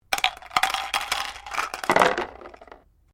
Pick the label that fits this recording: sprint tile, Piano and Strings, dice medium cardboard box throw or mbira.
dice medium cardboard box throw